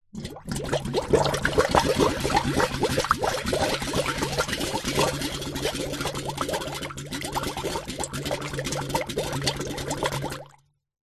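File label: household water in metal pot bubble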